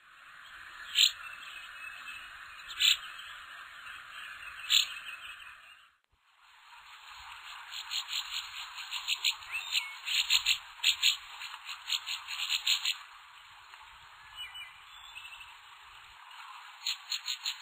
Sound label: northern rough winged swallow ohio